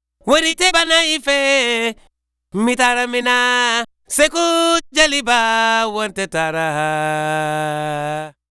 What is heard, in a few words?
African Vocal Double; Continental Drift Aftershocks; Vocals